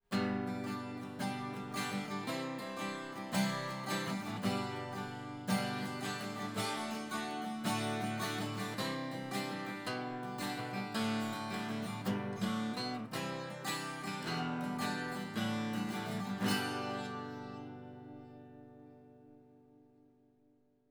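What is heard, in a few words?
Mid Side Guitar; Four couples of microphones have been placed in front of some instruments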